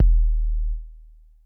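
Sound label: analog kick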